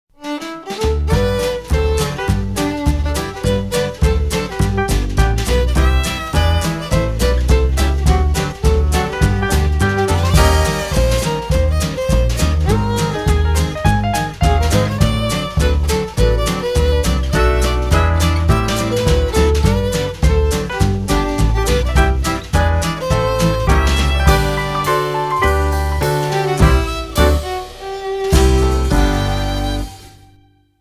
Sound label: happy 4 beat, guitar